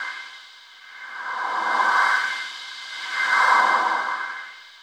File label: DESERT WIND; Distored Reality